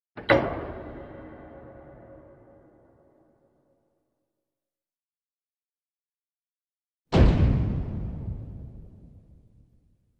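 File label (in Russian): metal, metallic clank, assembly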